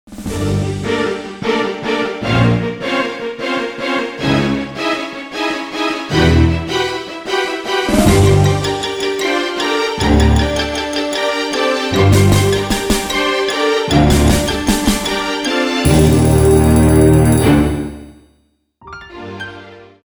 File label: movie trailer